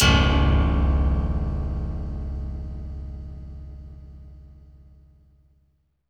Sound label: piano wire; Spectrasonic Distorted Reality 1